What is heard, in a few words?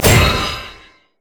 orbs; Diana